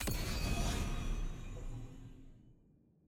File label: ui start button click